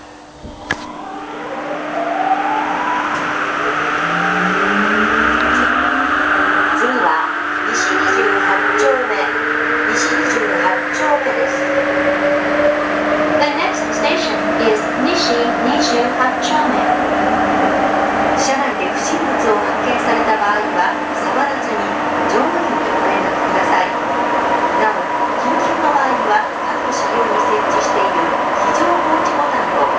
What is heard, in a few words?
mitsubishi